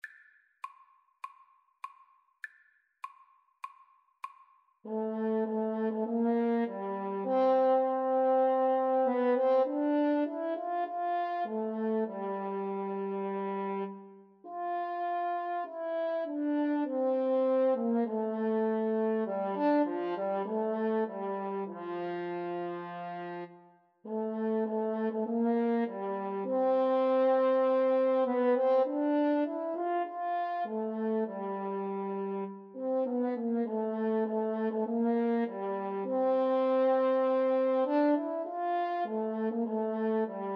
clarinet horn, Clarinet-French Horn Duet

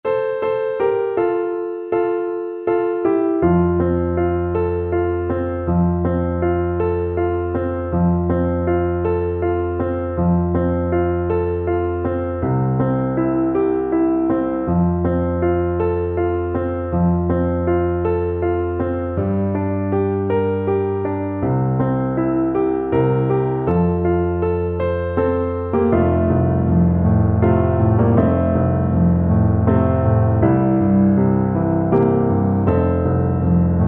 jug of punch, Tuba

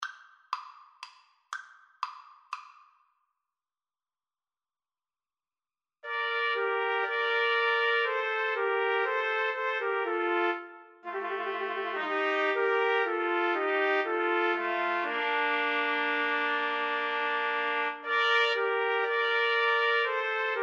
TPT trio, Trumpet Trio

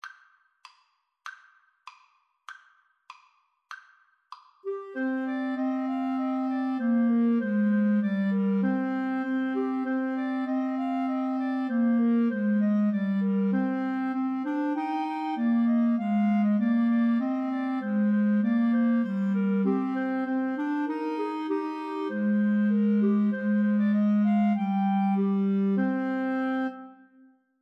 Clarinet Trio

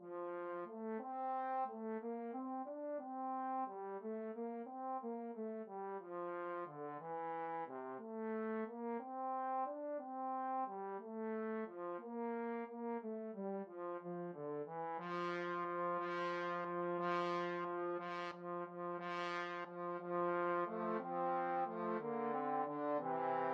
trombone trio

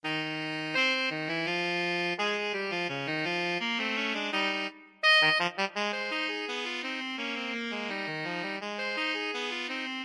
alto saxophone duet

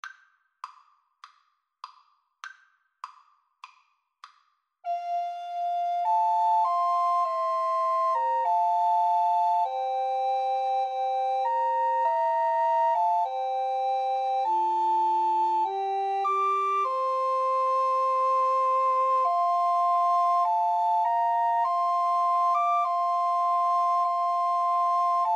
my faith looks up, Recorder Trio; SAT

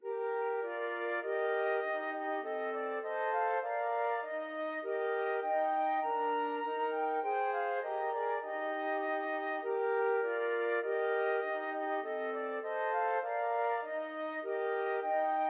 once, flute trio

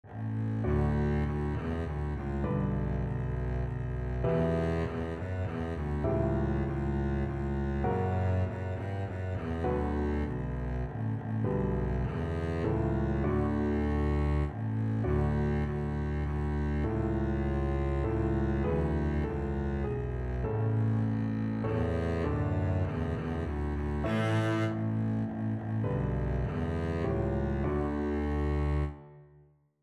we wish, double bass

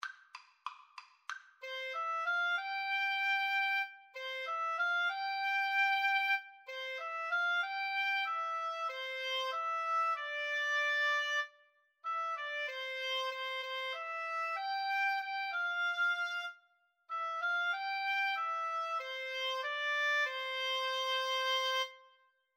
when the saints, Oboe Duet

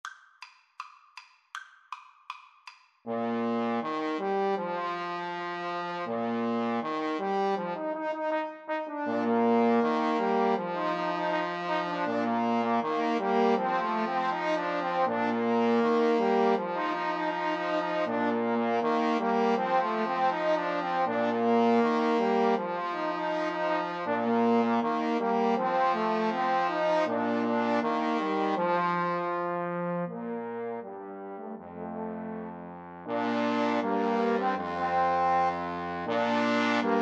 trombone trio

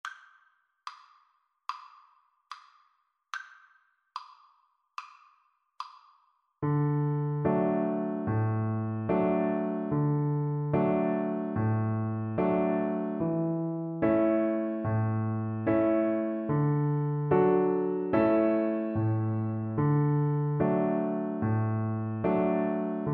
mary had a little lamb, Viola

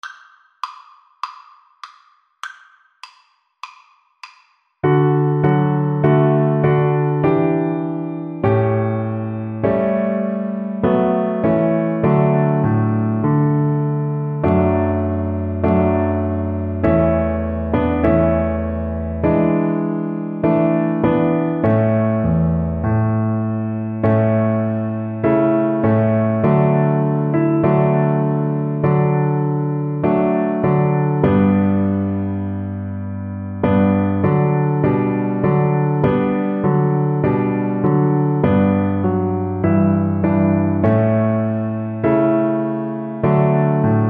onward christian violin

